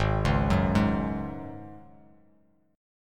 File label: Ab 7th Suspended 4th Ab down; Ab7sus4 arpeggiated